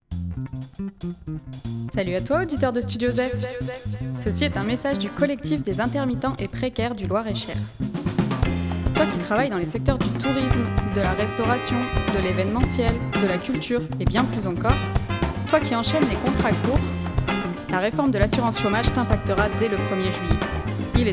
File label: Jingle radio